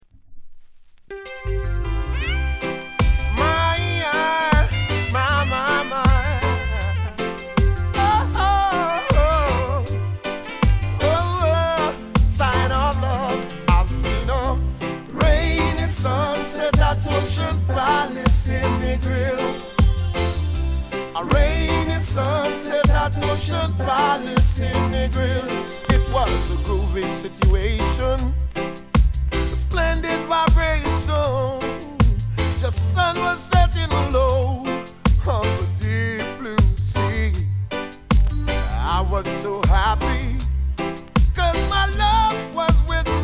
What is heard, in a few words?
rainy